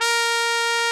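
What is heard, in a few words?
trumpet, cheese trumpet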